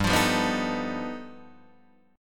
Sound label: G Augmented 9th, Guitar, Open D